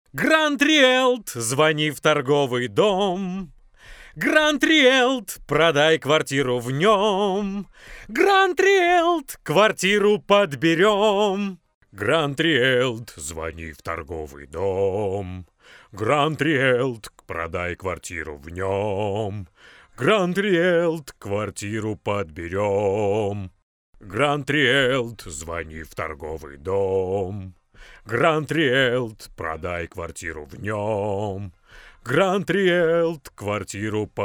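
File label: vocal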